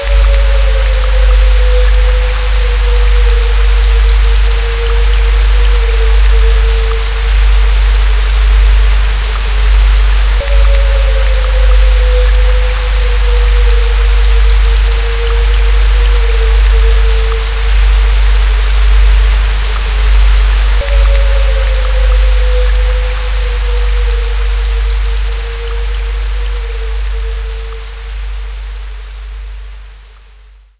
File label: Haunted Rain; Luigi's Mansion Dark Moon; 3DS Switch gamerip 2013